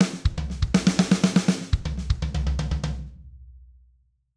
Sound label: Triplet Fill, drum